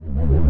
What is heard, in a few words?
phasemissile; Tanks